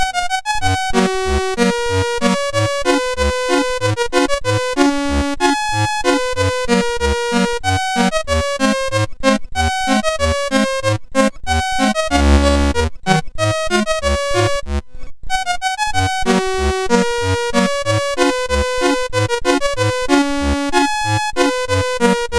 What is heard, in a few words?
Minuetto, CSG8580R5